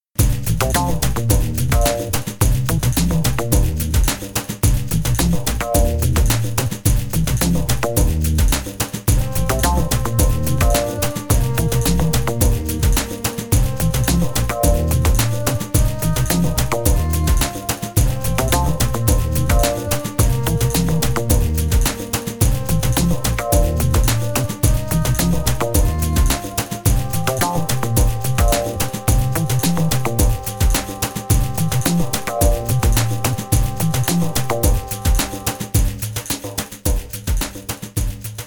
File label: Tribal Chase